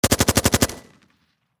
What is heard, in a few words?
Warfare Machine Gun 5.56 Caliber Heckler Koch Hk G36 Long Burst Distant Perspective